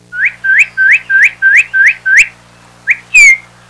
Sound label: cardinal sounds